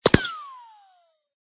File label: whine, bullet cracks and impacts; Anomaly Bullet Cracks and Impacts Redone; weapons